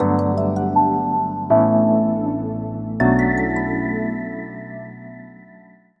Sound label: ringtones; Niagara; Essential; PH-1